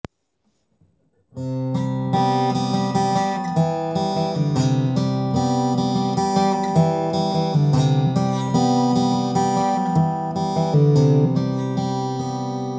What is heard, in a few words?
Guitar Songs with Lyrics